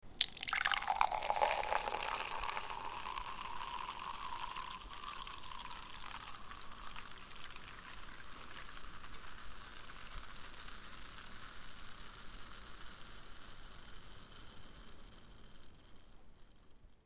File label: soda